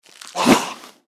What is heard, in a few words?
feral ghoul attack; npc